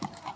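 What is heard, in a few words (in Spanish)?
Pasos Caballo, horse footsteps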